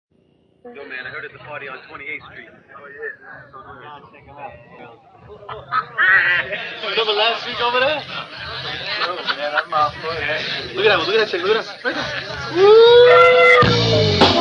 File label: Introductory Music